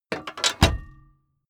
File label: van back seat turn back; verkehr transport; autos